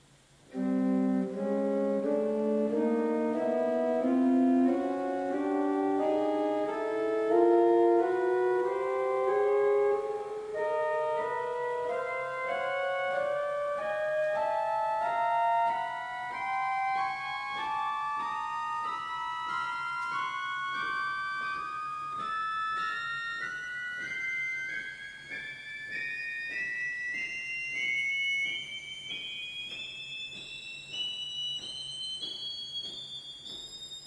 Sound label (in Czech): Manuál Kvinta 2 a 2/3', Ukázka zvuku, varhan